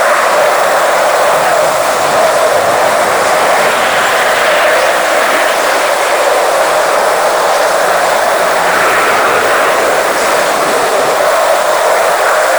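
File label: F-5E J85GE front, aircraft engine; DCS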